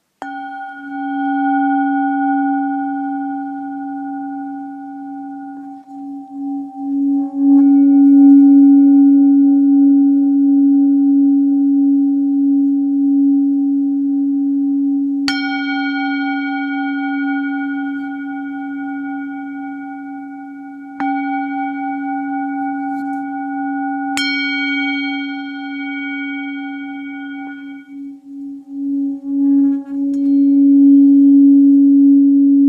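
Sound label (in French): Bol bengale do dièse; Bol Chantant Tibétain 800-900g Note Do Dièse Chakra Racine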